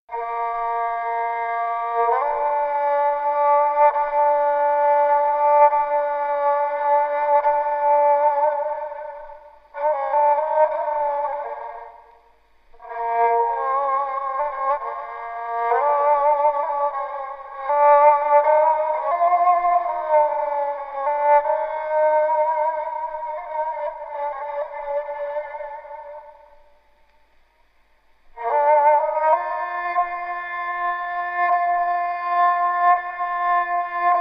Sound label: klasik kemence, halk calgilari